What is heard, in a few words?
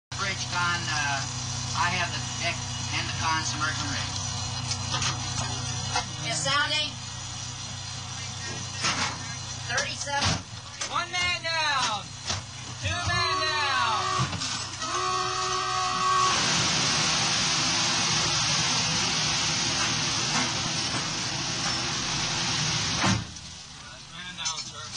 opening dive